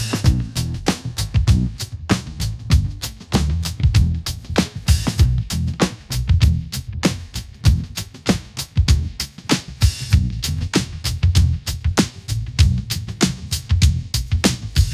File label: midnight groove tension, ringtones; ai-generated